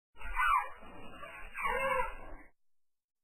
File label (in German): trompeten